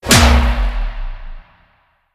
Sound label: Land; smite; raijin